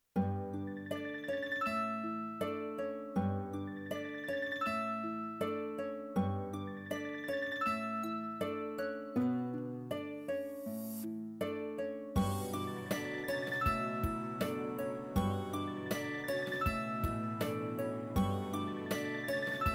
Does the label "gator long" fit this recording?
No